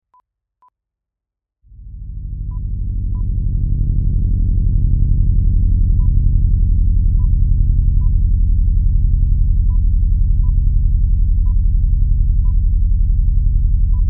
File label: Propeller Drone Spaceship